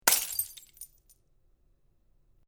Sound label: Glass Bottle Break, Nicely defined glass smash; Nicely defined glass smash as bottle crashes to the ground and splinters.; Breaking Sound Effects